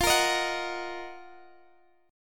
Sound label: Eb Minor F; Ebm/F strummed